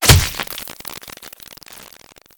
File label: flesh; CosmicRage; general combat weapons generic rifle